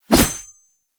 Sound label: Sword Swipe, Melee Whooshes; Warframe; Weapons